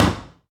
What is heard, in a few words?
Spit Out; blastmorph pinball; xbox series xs